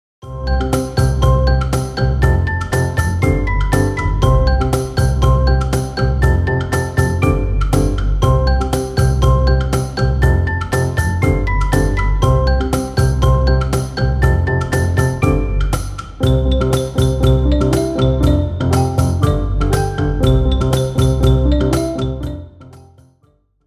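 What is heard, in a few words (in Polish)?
korale jesieni instrumentalny fragment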